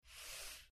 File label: Short Squeaky Mouse